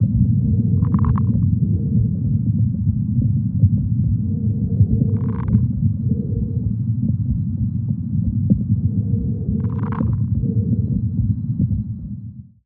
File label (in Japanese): 捻髪音